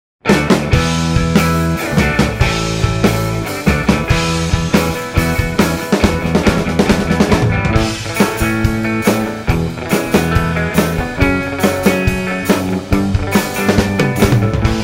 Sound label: British Invasion; Soundzabound Volume 5